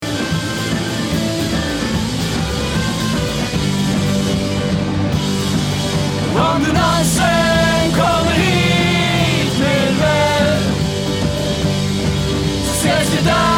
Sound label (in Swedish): mälarn kör